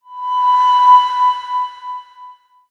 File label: siren